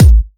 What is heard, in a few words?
natural kick single hit A key, kick sample tuned to the A note